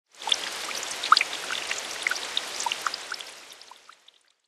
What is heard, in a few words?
rain on water; factorio